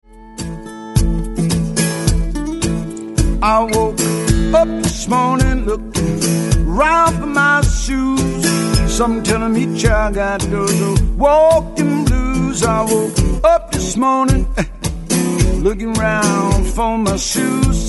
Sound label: walkin blues